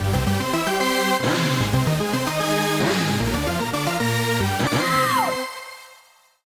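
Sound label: The "Course Clear" jingle; SMM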